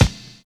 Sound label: bass drum one shot a sharp key